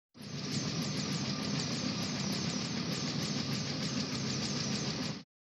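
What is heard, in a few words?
Propeller cuts the air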